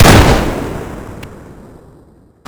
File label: shotgun fire, Remington; HL2-Nerv